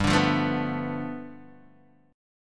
G 9th, Guitar DADGAD

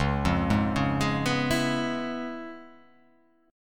Db 7th Sharp 9th Db down, chord shape; Db7#9 arpeggiated; JGuitar

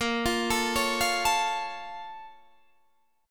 Bb Minor Major 7th, Guitar, Open D; 20,20,19,19,20,19

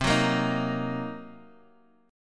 C Minor 7th Flat 5th, C, down, chord; strummed; JGuitar

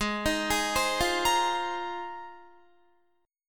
G sharp 6th Add 9th, Guitar; G#6add9; arpeggiated; 16,18,18,17,17,17; 4E,4A,5D,5G,5C,6F

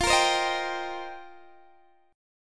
F 7th Suspended 2nd Suspended 4th, Guitar DADGAD; F7sus2sus4; strummed

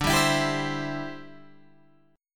D 7th Suspended 2nd Suspended 4th, Guitar Open G

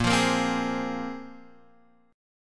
A sharp 7th Suspended 2nd A sharp, Guitar Drop D

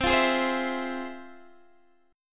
F Minor Major 7th C, guitar chord

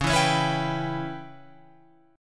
C sharp Minor 7th Flat 5th C sharp Guitar Open F; C#m7b5; strummed

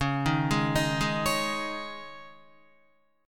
Db Suspended 2nd Guitar 4E,4A,5D,5G,5C,6F; arpeggiated; Dbsus2; JGuitar

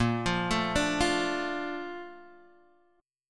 A sharp Major 7th

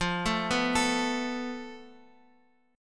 F Major Flat 5th, Guitar, Open F; arpeggiated; x,x,5,4,2,4; JGuitar

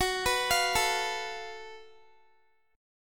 Gb Minor Major 7th Double Flat 5th, Guitar, chord; arpeggiated

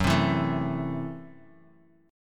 Gb Minor 7th Flat 5th, Bass Standard; strummed; 14,15,14,14; JGuitar

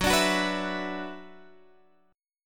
Gb 7th Suspended 4th Sharp 5th Gb

